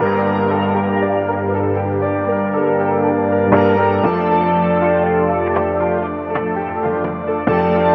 key

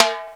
timbal

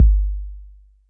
eerie, Kicks Heartbeats

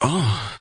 uh male orgasm; ooh ahh; uh um

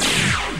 blaster, Zaps and Beeps

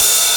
Hats Electro Open

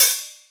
Hats Electro Open

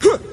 huh; Voice; ooh ahh

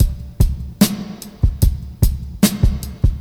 feeling tense, Boombap drum loops

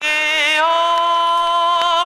ayoooh

